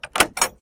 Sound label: fence gate open; doors